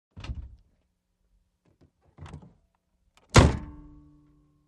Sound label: Aston Martin Hood; ext. trunks hoods